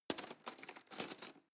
cutlery teaspoon drawer handle

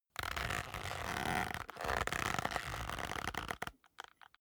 leather creak long soft

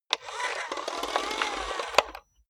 robot movement slow

